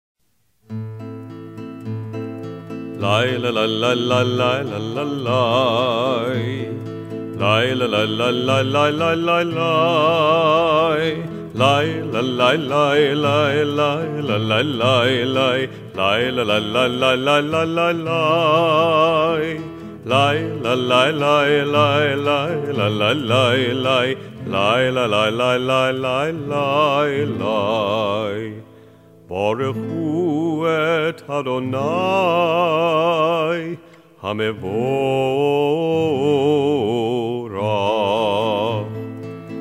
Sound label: Barchu sung; Cantorial Soloist